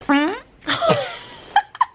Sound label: Fart and Laugh